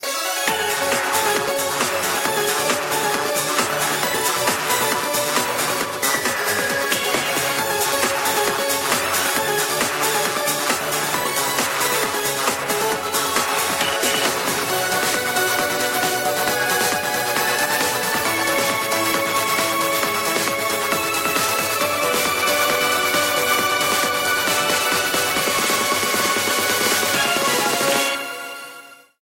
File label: Euro Dance, ringtones